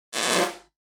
fart loud reverb; funny stinky smelly poo

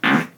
short loud fart

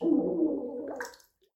fartreal samsung galaxy smartphone

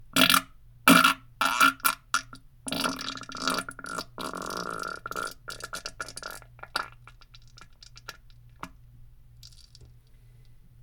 loud wet fart

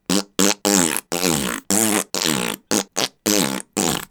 wet fart collection

